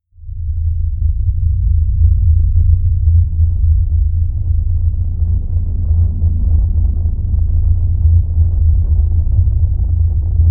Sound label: drone tracer